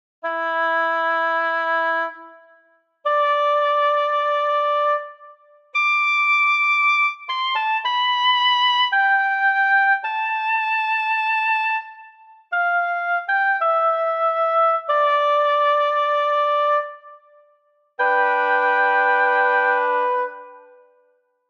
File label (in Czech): OBOE s vibratem